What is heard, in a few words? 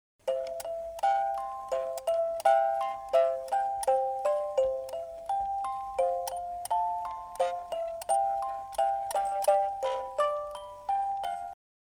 jingle bell